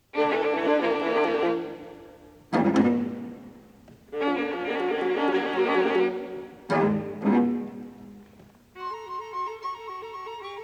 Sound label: String Quartet in Fifth-tone System, Allegro vivace; String Quartet No. 16 in Fifth-tone System, Op. 98x - II. Allegro vivace (Vzrusenê- Con affezio